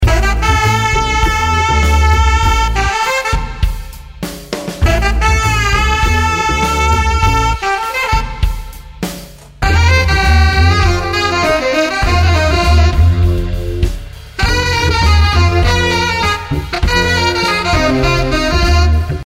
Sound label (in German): Gitarre; Waves Reel ADT; Waves CLA Bass Stereo; Ueberschall Guitar; Anstelle des Saxophons habe ich nun eine Gitarre eingesetzt (Ueberschall Guitar)